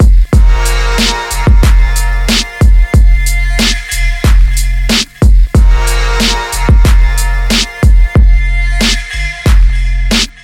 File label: big boom sharp kick bridge rap sample - fm; instrumentals; edge dark rap sample